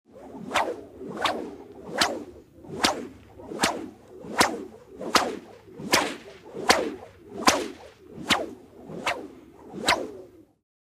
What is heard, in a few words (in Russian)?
zvuk rassekaemogo knutom vozduha